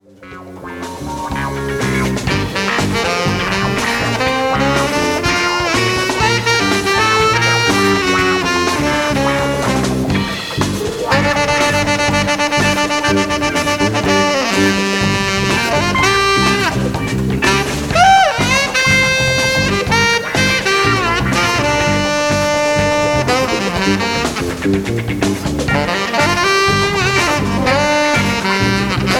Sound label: jungle solo